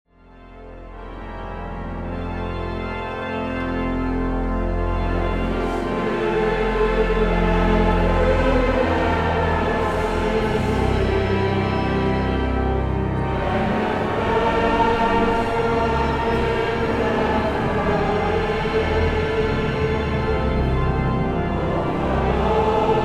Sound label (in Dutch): koor en samenzang